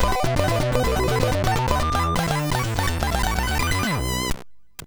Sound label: sid MOS6581R3